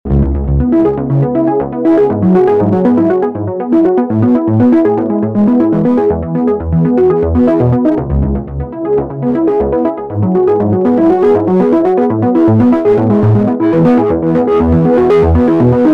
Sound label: lead arp wet; chiral audio